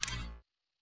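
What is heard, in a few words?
Title Button Click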